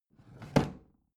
bathroom drawer open regular

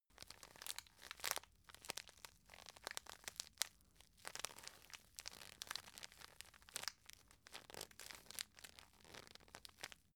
tissue package handle

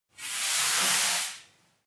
broom sweep slow single

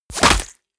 bullet small impact body flesh

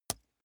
wood impact small lash

